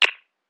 Snap; Cymatics; Eternity Sample Pack; Drum One Shots

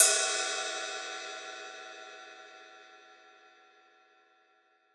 Riddim Ride; One Shots; Hats & Cymbals; Ghosthack; Riddim Essentials 2020

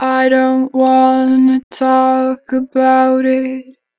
Vocals, I don't want, 2 bar flat; Harmor Vocals; Pitch Flat

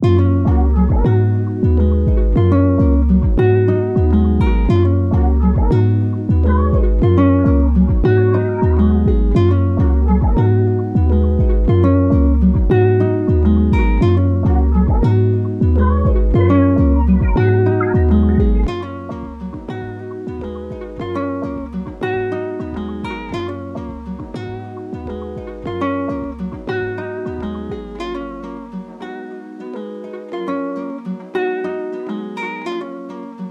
103 BPM F# Maj (Afrobeats); Cymatics; PARADOX II; Melody Collection

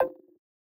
Vintage Percussion Ditone; Cymatics; Mystery Pack Vol 4

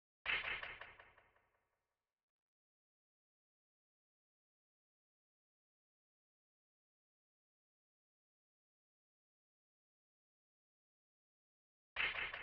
Hallway Knock Drum Loop 82 BPM Hihat; Drum Loops; Loop Stems; Cymatics; Mystery Pack Vol 4

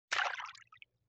Water Splash Small; Vintage Cartoon; Foley

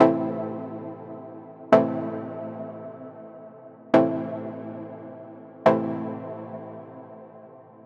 Deep Stab Loop Am 122, Deep House; Techno; Ghosthack; AC2023 - Day 17 - Dub Techno & Deep House Chords

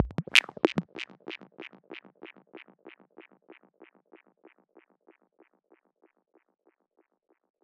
Squelch F# 142 BPM, Psytrance; Ghosthack; Psytrance Visions Volume 2

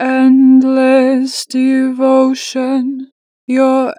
Natural Vibrato, Vocals, Devotion, 2 bar vib; Harmor Vocals

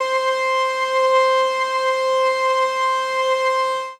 LEAD Analog - C, Synth One Shots

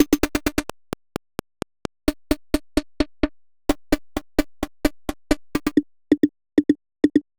Glitch 130, Techno Drums; Drum Kits; Stems; Ghosthack; Drum Kit 001

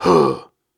Grunts and Chants, Viking Vocal

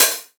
One Shot Hi-Hat, Deep House; Lover; Ghosthack; Advent Calendar 2019

